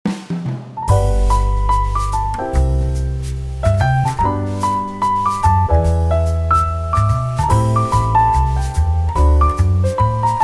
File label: a jazz piano